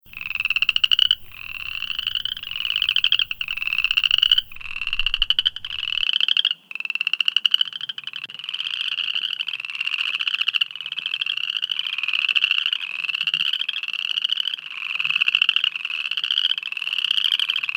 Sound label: Upland Chorus Frog; Wildlife